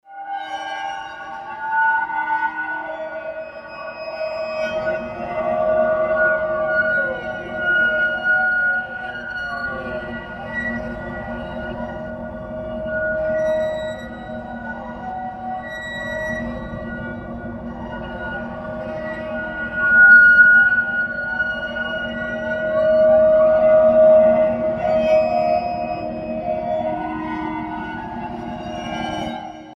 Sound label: atmo